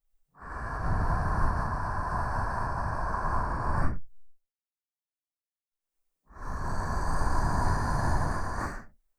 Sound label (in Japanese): 吐息